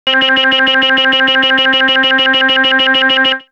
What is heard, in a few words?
Synth; AMG